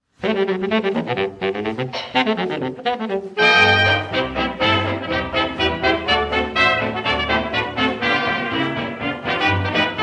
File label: Orchestra